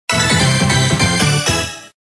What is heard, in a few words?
Fanfares; Typing Adventure